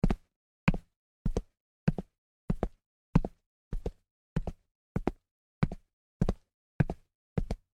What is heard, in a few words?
Footsteps Combat Boots Walk; Footsteps (Sound Effects:Human)